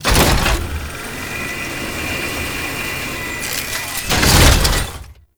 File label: Elevator; Warframe; Entrati; Necramech Hack